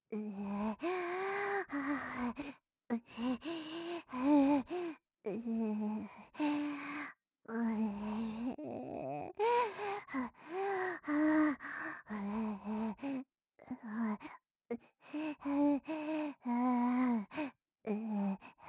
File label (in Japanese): エッチな 呼吸音 ボイス; お狐様